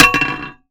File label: Pipe Collision; zps; Foley